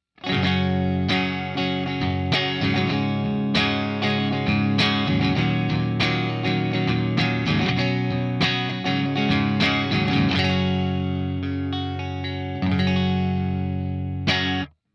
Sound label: solo lead clean; Helixシリーズのマルチエフェクター; helix amp model